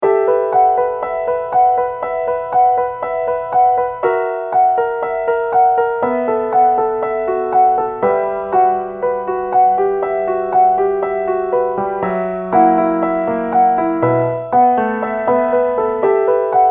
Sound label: piano